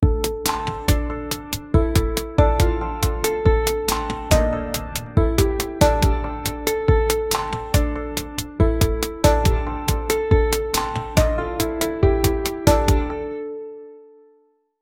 generate an easy tune for

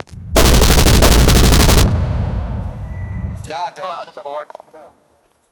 6 war cannon fires

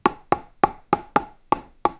chef chops raw chicken on; ambiance

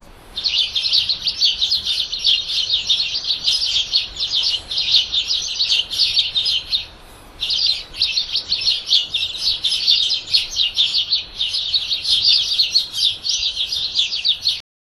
the sound of a sparrow, birdsong

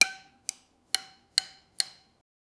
sharp chefs knife swiftly; food preparation

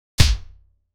hit marker for fps game

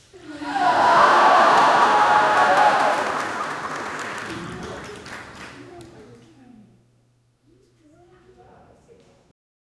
stage magic show ambience; theatre

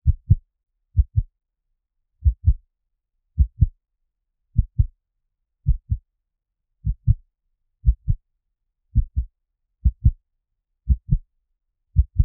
slow heartbeat sound; relaxing

